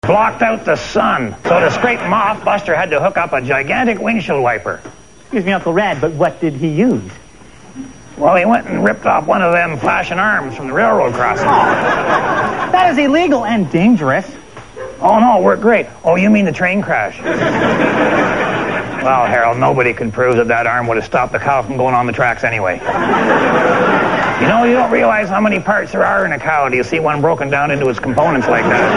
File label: Cow; RedGreen